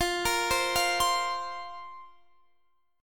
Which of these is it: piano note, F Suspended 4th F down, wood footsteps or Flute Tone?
F Suspended 4th F down